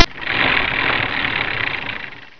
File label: SPINNIN